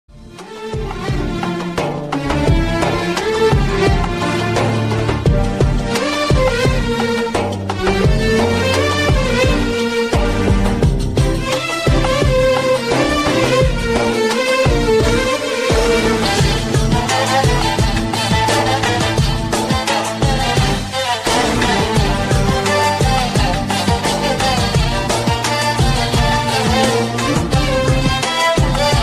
oriental music

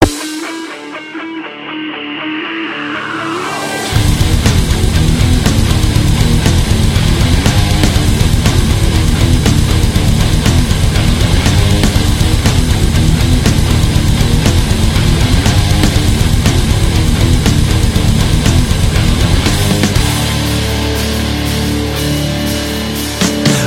metal guitar

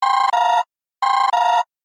android notification

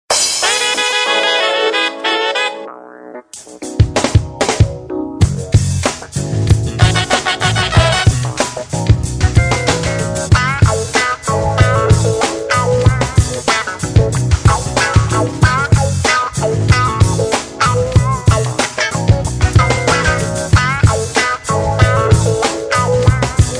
nice jazz ringtone

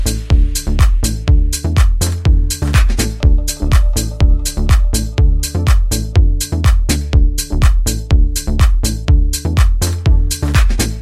best minimal

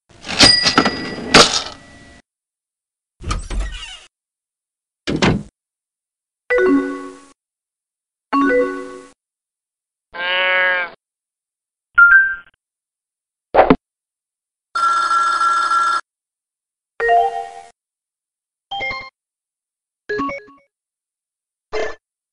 old aol instant messenger mix

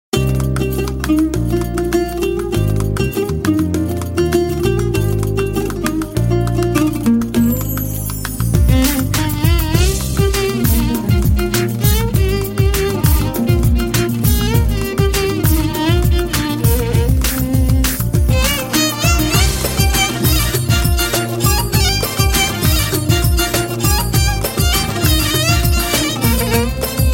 turkish ringtone

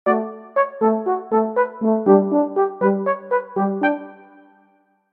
huawei p9 tuba

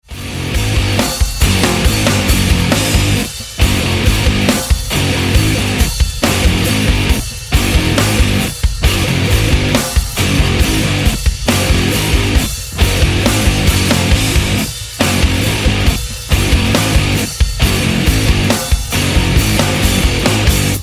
hard riff

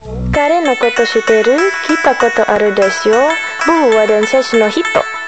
japanese ring

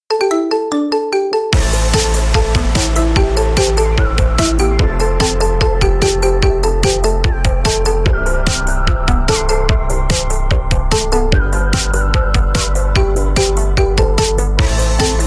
marimba remix

